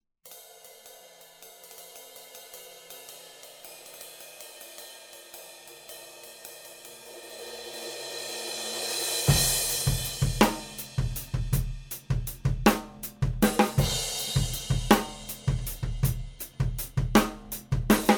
Drum Overheads

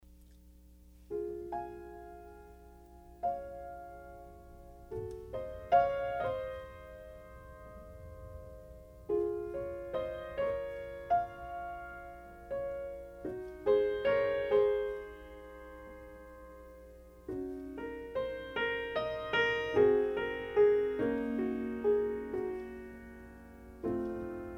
Piano D6

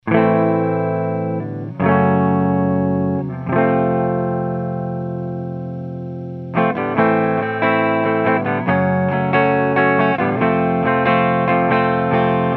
AMP Clean Horizon, clean eguitar; Lauten Audio Horizon LT-321; Tube Condenser

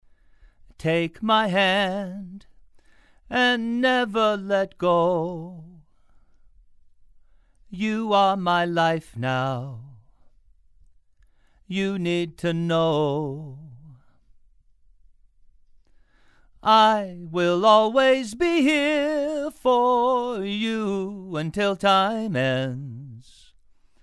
Male Vocals

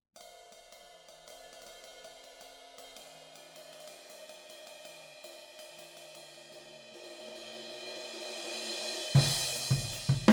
Drum Overheads R122

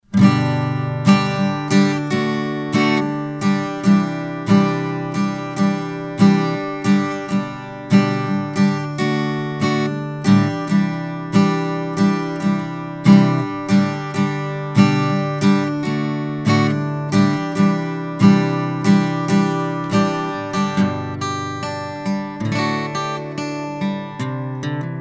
Acoustic Guitar; mic shootout

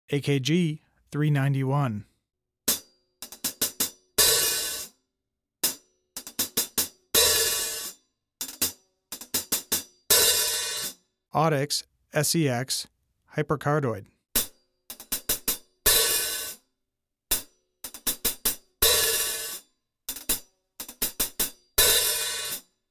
hihat; scxhc

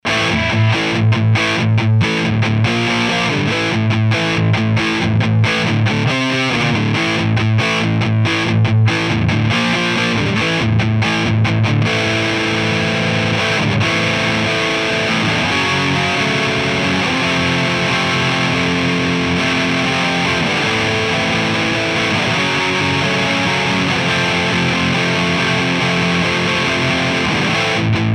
AMP DIst

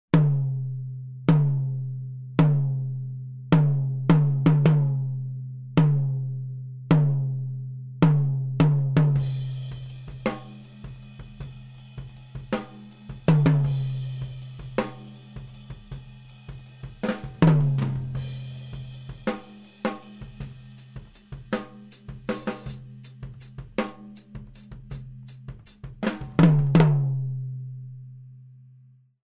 Tom Microphone SCX1

